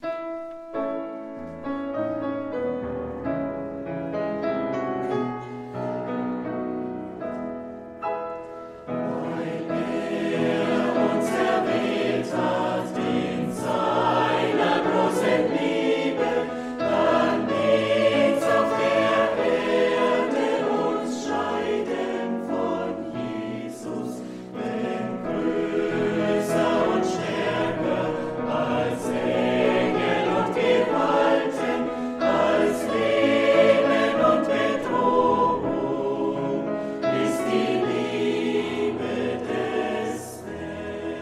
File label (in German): Chor